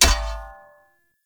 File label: Great Sword Melee Impact Thrown All Surface; Weapon Impacts; Founders Great Sword; Environment Thrown